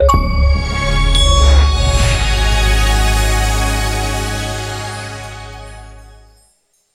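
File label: Xbox Rare Achievement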